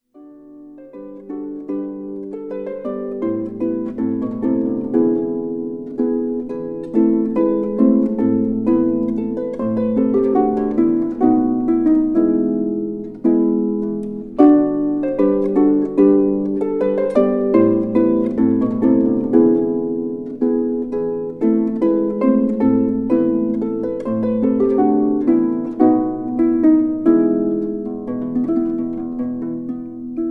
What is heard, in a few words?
Solo Taniec polski z Tabulatury Waisseliusa